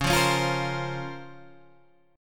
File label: Db Major 13th, Guitar Open G